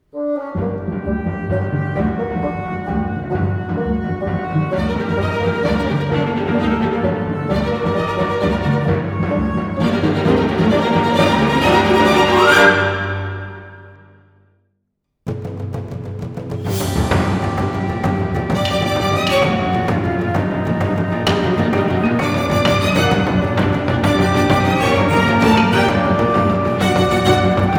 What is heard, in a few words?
Orchestral action